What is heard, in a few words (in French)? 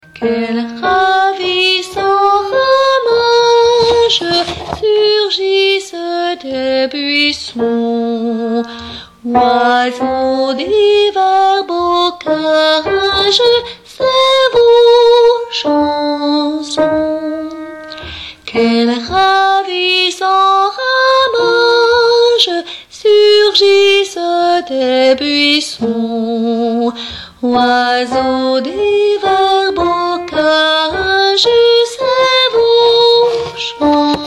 fanfare alti; Fanfare du printemps 3